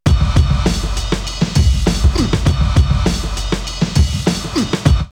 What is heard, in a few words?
Greasy Beat 100, drumloops OldSchool; X-Static Goldmine